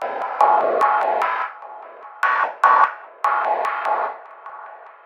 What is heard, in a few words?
loop, techno atmos